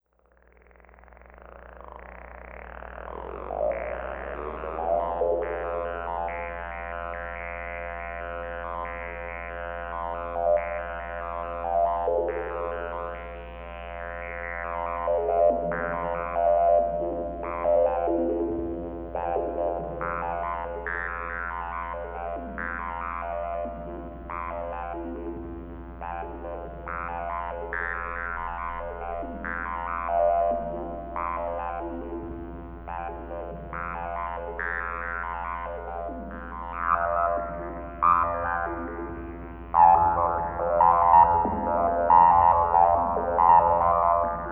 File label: frogs